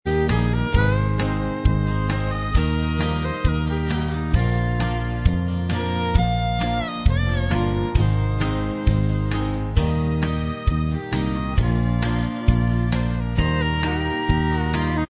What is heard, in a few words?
Inst Track, backing tracks